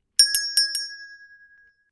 shop bell

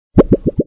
potion ready bubble effect

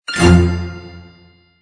bright tiny reward

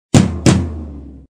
music drums tom low fanfare short

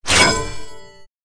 Pickup Armour Shield

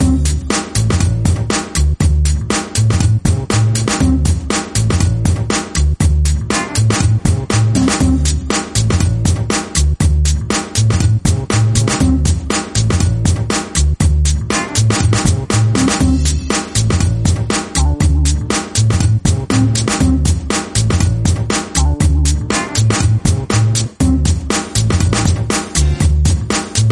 energetic funky breakbeat loop

